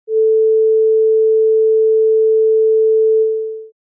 sinus

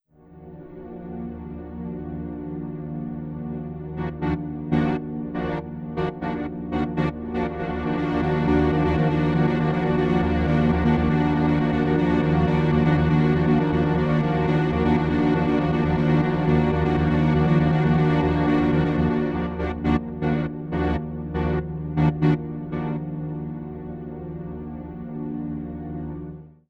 fade groove; u-he uhbik

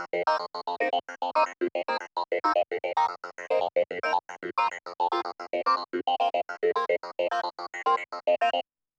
seq vocal filter velo; Clavia Nord Wave

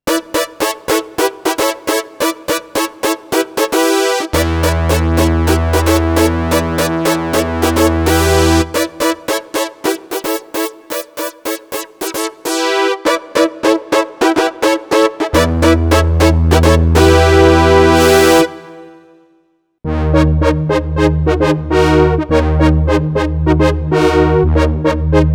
brass; cuivres, dont certains sont inspirés du JP-8 et du CS80; arturia origin

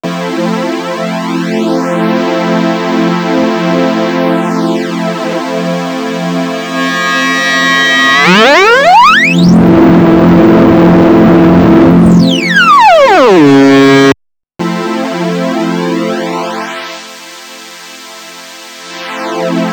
Waldorf Blofeld hires manual comb

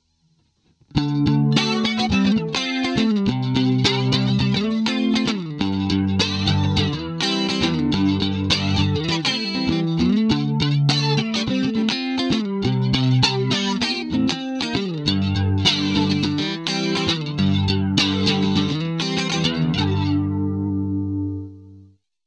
voodoo flanger; magix; packplug

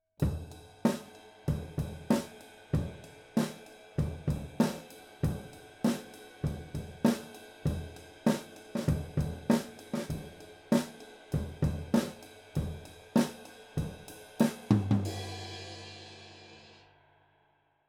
drums stereo ride; akg 214

